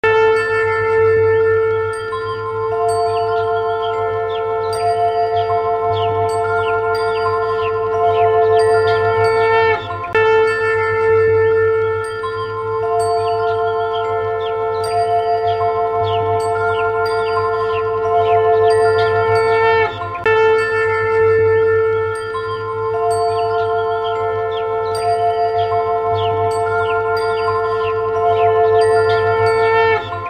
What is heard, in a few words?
Shankhnaad Ringtone